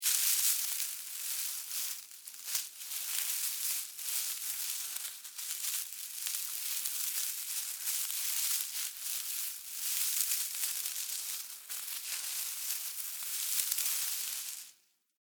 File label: Animal, Critter, Movement, Grass, Foliage; Flies; BlastWave